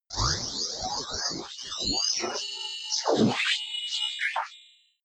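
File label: lo-fi randomness, science fiction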